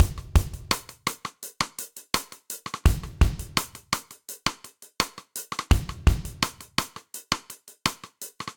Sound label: Sidestick Bright Room 84bpm; Drums; Raincoat; Ableton; Factory Packs; Session Drums Studio